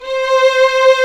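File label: strings, 8pc; String Master Series; Vlns 2-5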